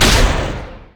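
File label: rolling thunder attack; LoL; volibear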